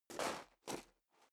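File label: step high heels gravel medium scuff, footsteps; schritte; menschen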